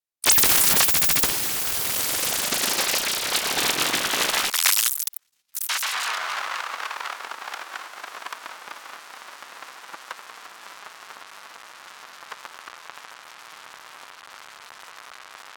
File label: tuning